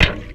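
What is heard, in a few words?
ARTSH HYBRID, percussion metallic; Rarefaction; Poke in The Ear With A Sharp Stick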